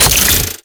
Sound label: Ink Rifle Mech, Weapons; Warframe; Tenno